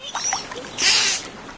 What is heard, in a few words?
underwater maximize; windows 9x; sound schemes